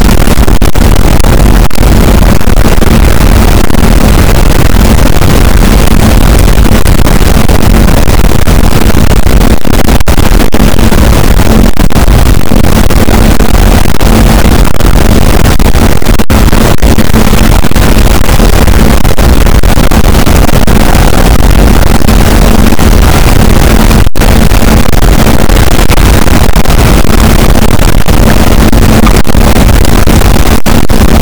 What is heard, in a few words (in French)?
cachalot positif, boat; Physeter Macrocephalus